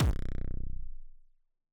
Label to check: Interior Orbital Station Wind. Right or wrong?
Wrong